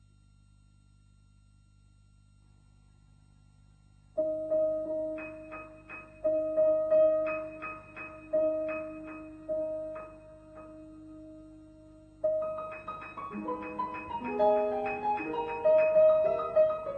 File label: Piano